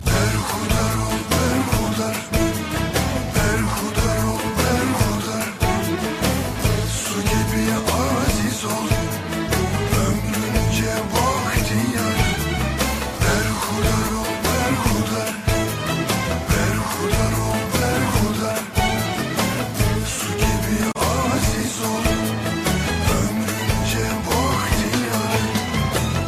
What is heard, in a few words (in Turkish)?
melodi